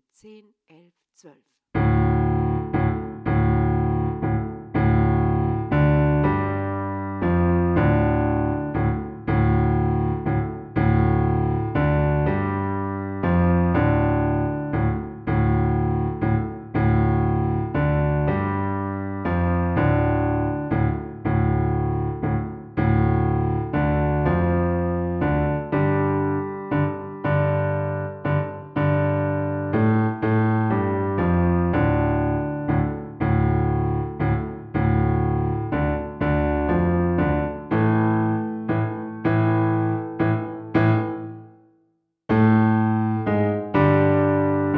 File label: Blues for slow